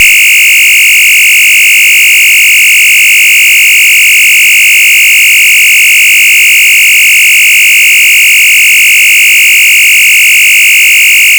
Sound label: Sweeping fast, combined signal; pfannenberg; signaling-technology; combined-signaling